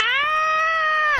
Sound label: bagel scream